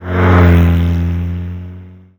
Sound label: Car Passby Med